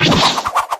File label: throw; smiles